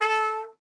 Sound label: A Trumpet